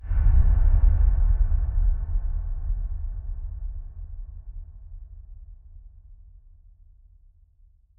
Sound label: enemy assassination stinger